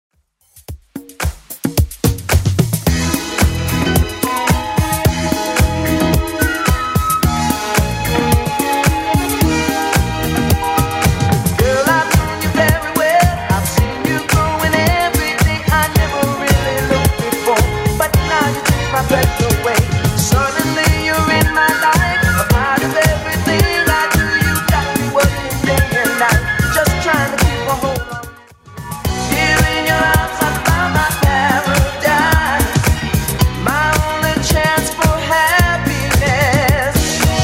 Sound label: 70s Club Edit 110bpm